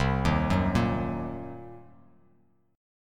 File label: C Minor 7th Flat 5th, Bass Standard; Cm7b5 arpeggiated; JGuitar